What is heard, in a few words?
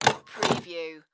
Three Hole Punch